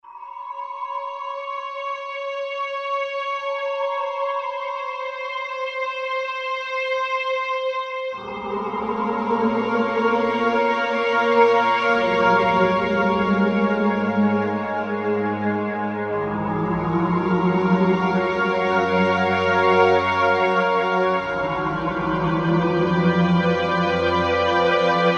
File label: Korg M1 Zephyr; Factory preset examples